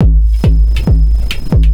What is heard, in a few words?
percussion loops 138; Techno ID